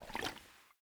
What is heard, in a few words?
bota bag slosh; Rust